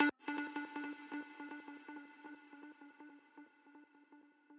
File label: arp